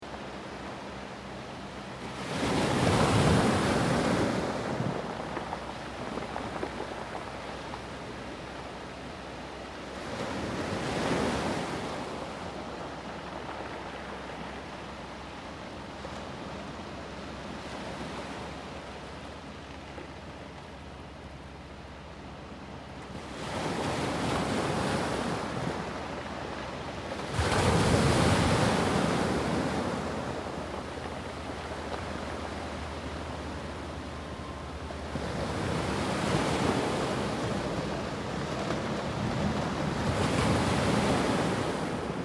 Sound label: Stony Beach Ocean, Ocean waves washing up on a stony beach; Ocean waves washing up on a stony beach. Pebbles and stones rolling onto each other with the ocean surf.; Ocean Sound Effects; Loop